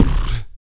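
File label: vox percussion, Vocal Planet Jazz FX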